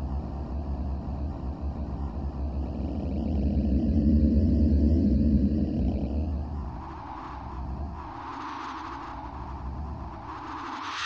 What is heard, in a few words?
Beach Wind